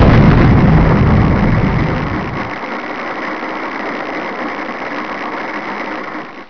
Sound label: freeze bombs; Tanks